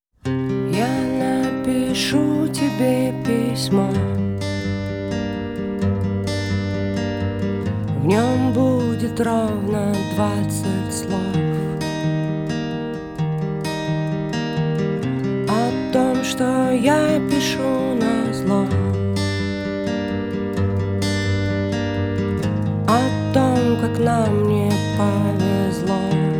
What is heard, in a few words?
acoustic